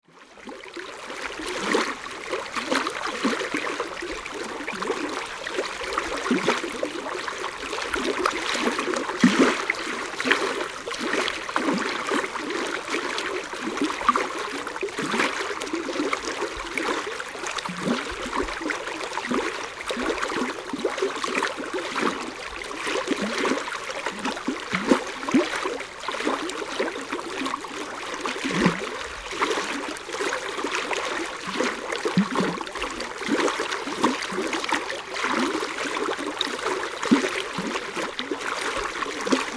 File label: lone star geyser stream